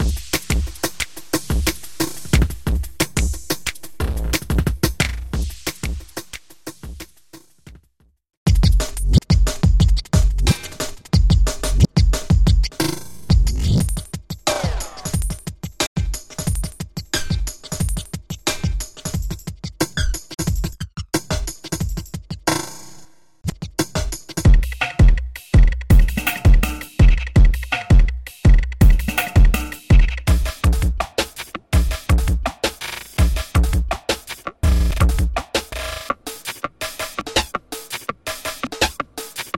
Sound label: jungle drums hi octane; electronic drum loops for RnB, Jungle, House, DnB